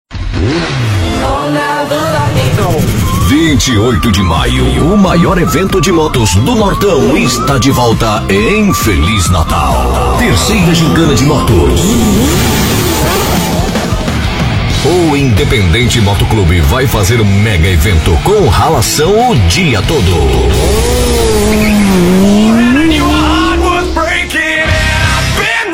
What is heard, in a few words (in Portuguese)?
Gincana de Motos Electro